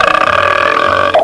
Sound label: Burp